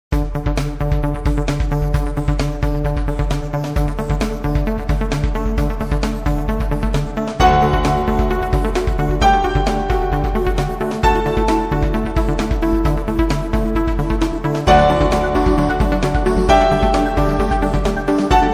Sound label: instrumental ringtone